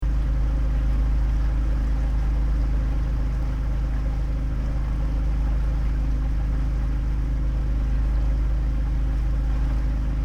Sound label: Barge cabine engine and water semi Closed windows; ESD